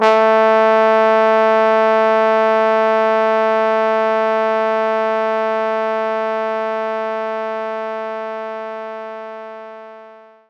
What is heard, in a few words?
Trombone A2; knights try